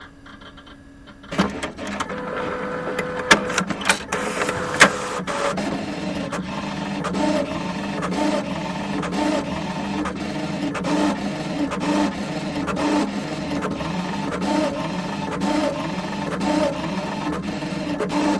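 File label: Machine Printer Click; printers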